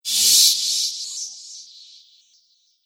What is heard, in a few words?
Stardust Swish, whoosh sound effect